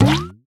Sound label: slingshot; pinball; Chocolate